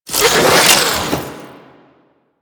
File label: Necramech Repair Drone Left; Enemies; Warframe